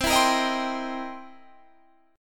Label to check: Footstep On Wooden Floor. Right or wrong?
Wrong